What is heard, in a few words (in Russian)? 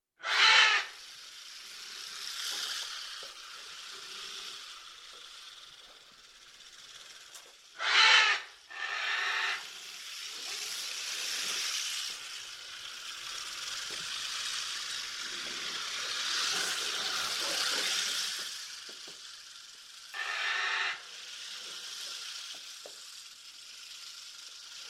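дикобраз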